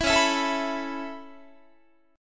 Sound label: C sharp Minor 7th Sharp 5th C sharp Guitar; C#m7#5; strummed; JGuitar